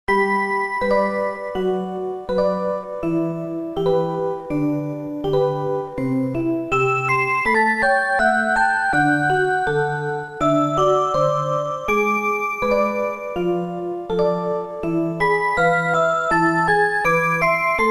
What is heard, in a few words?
Air